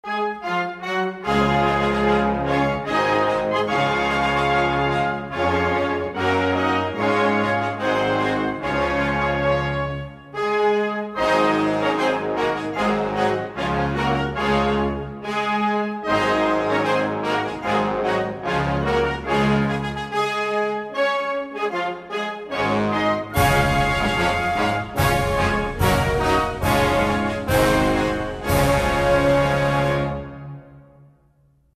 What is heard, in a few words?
anthem